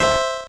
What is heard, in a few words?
level squish C E